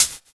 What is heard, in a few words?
Hhat, Hihat; Equipped Music; Loungin House